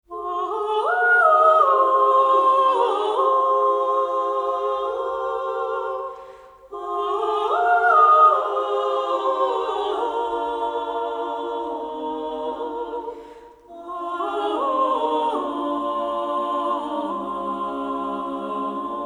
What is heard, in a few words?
Chor